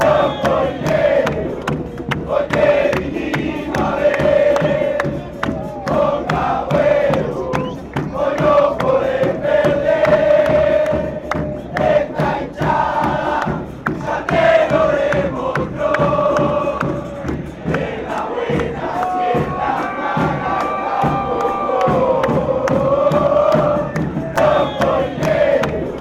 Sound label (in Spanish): patronato chant